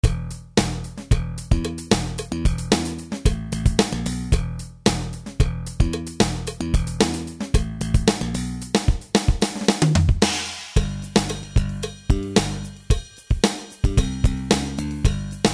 DR-770 Funk Bass

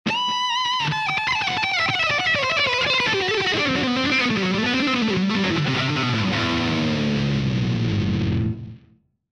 double dive; pod x3

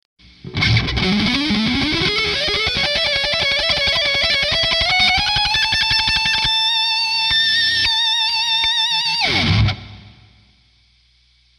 Cyclone Lead No Mids

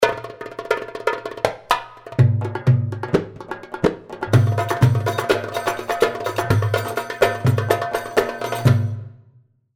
Havasi 125, darbuka; Roman Havasi

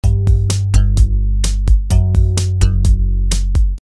regal buzz source

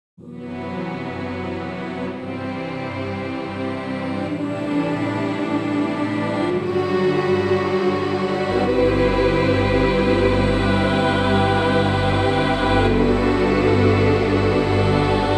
Mystic Ocean

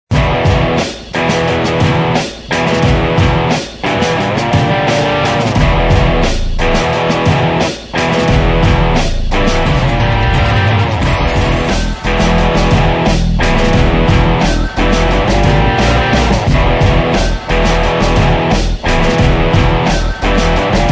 TS7 Booster For Amp

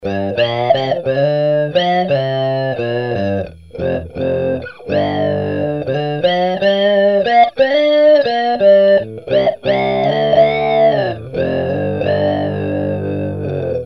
talkbox

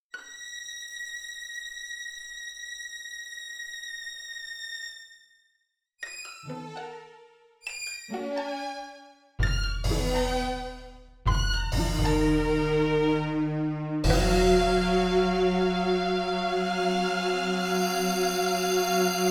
Orchestra on Strike

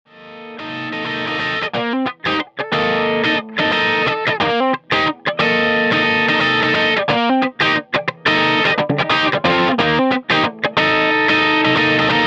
Funky Single Coils at 1 Watt

